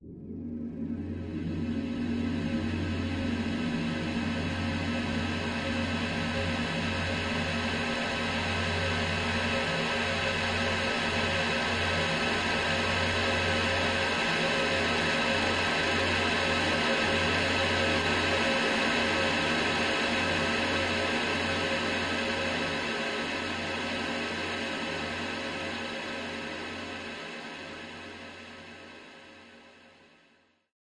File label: very phasing noise